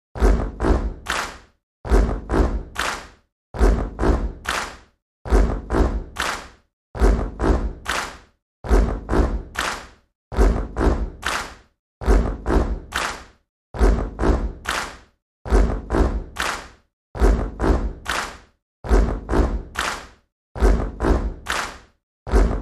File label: Basketball Crowd we, sports arena